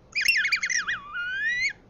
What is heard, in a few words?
chat, Mouse Droid; Star Wars